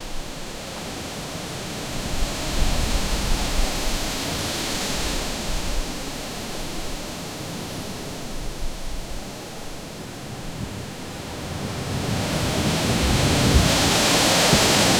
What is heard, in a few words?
strong sandstorm blowing; deserts